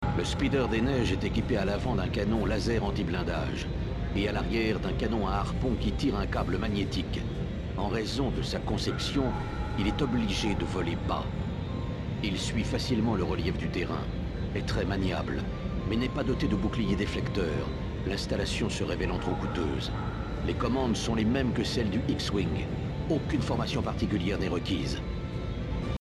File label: Snowspeeder; Star Wars